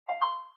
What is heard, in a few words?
capture shutter; Samsung Z1